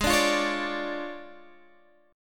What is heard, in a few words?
D Minor Major 7th Flat 5th, G sharp